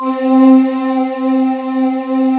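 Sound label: choir bell C4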